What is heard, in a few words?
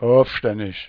oof